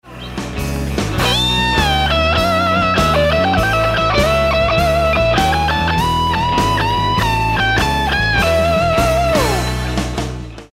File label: Lick, guitar